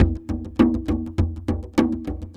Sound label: Java Gourd Fast Harmo; Ambient; Sonic Foundry (Sony Creative Software); Ambient Atmospheres & Rhythms